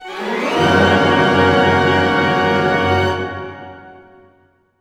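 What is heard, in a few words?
glissando F# major, orchestra; Sound On Sound - Peter Siedleczek's Orchestra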